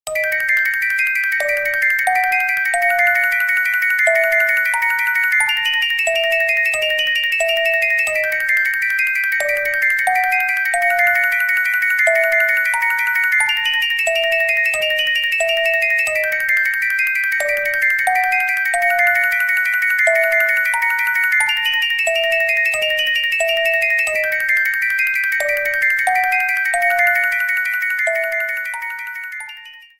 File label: Castle Night; SMM2; SMB